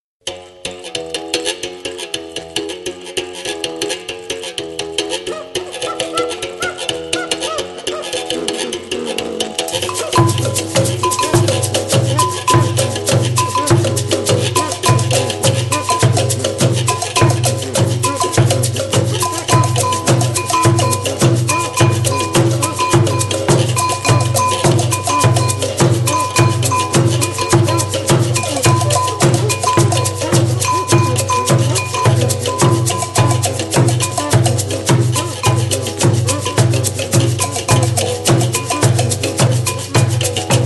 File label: DRUM